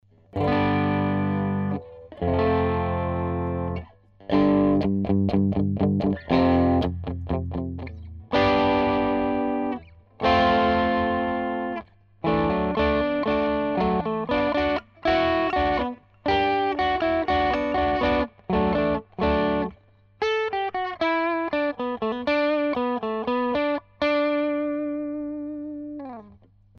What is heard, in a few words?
Crunch Guitar